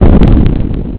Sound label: explosion